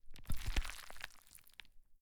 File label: gather meat